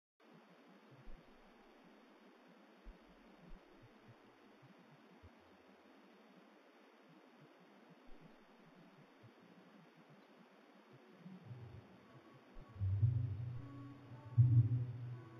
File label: Signal; Earth